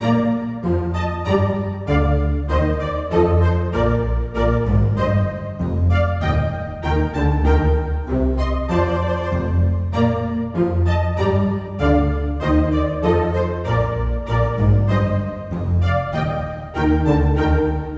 Orchestre Baroque, Menuet in C Major; LWV 35 No. 3

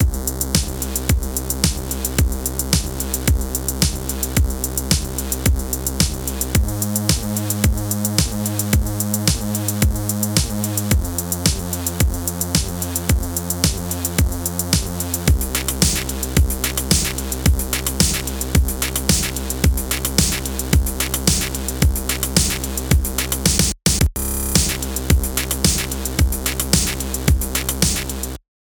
instrumental

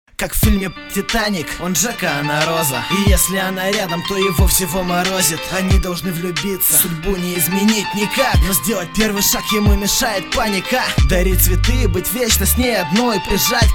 Electro House

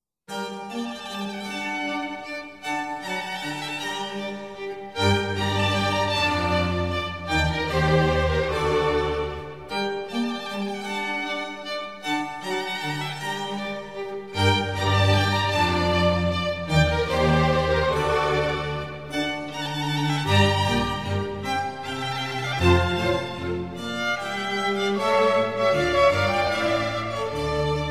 Violin Sonata in G Minor; Arcangelo Corelli; Op.5 No.5; II. Vivace

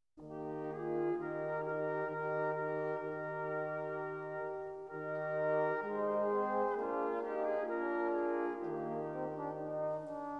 Brass Ensemble, Galliard

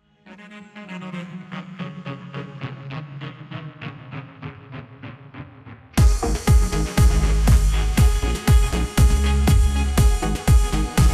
Synth City

